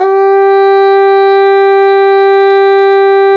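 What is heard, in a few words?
brass plunger trumpet, plunger mute; Roland L-CD702; Plunger & Wa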